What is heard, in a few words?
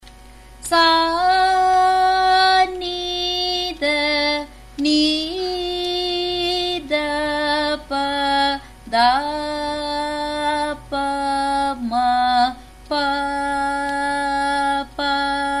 Sarali Varise, F sharp